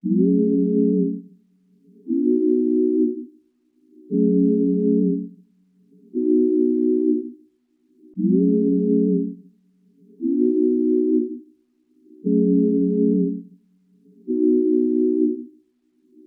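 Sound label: Pad Loop C minor 118 BPM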